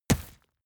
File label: horse step dirt